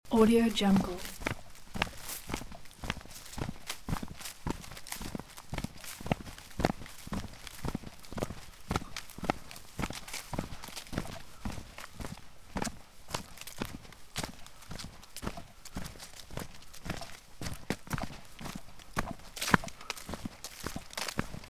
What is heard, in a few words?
fast walking in rubber boots in forest loop; human sounds